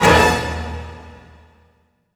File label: hit, orchestral; Orchestral Loops